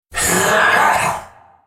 Droid spellcast effort

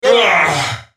dying; Jayce Sentinel

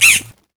ravens caw; odin

